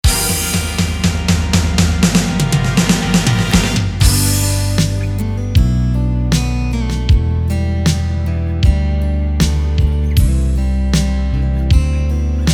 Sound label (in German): Nostalgie Drums